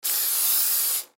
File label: Cooking Spray, Cooking Spray Squirt On Pan Can; Food Prep; Restaurant; Household; Blastwave